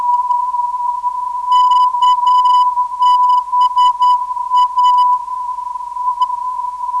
431kHz, NDB; KBA

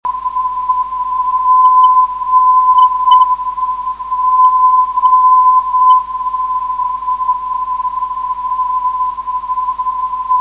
452 kHz, ndb

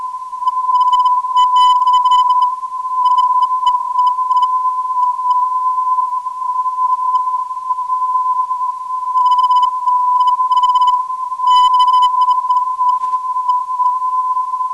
429kHz, ndb; OBI

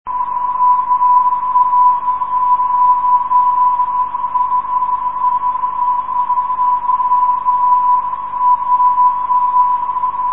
ww 420.1khz, ndb

